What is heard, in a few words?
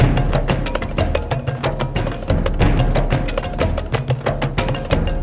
music; rayman origins 2011 gamerip; music world; bossbird part3